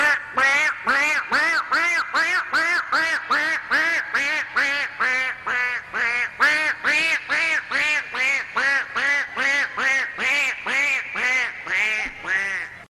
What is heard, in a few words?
duck quacking